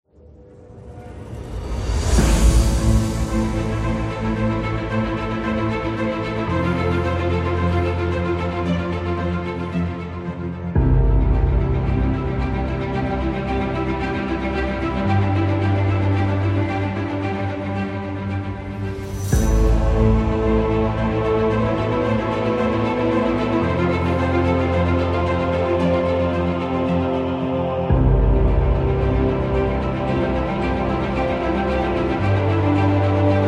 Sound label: Epic Cinematic Trailer, epic music; epic music vol 1